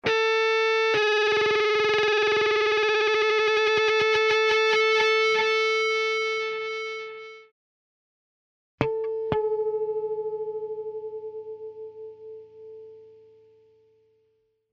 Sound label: guitar tuning sample